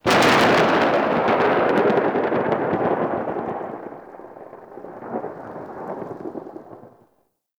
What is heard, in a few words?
Thunder Crack; Warframe; Tau